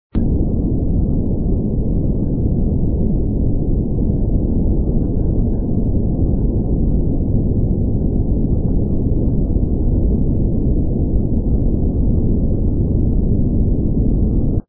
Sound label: tootlantis underwater